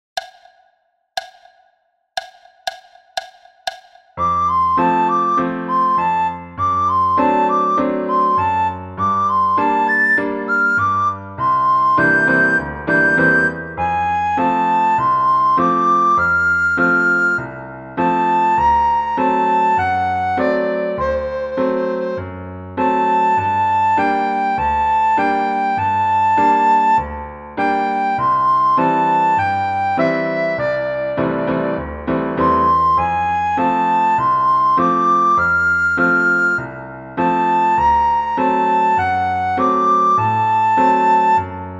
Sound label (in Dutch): sopraan; Super Mario World 2 Yoshis Island; Blokfluitles